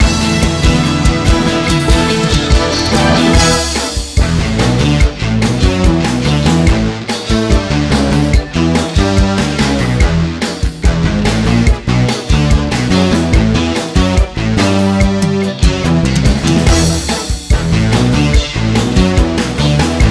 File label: game over